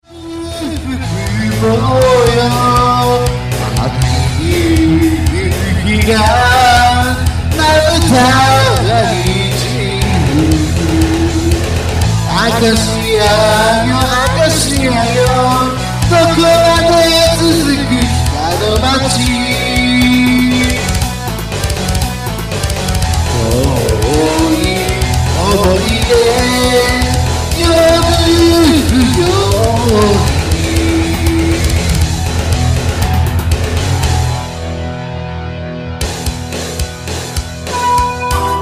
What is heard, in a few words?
enka rock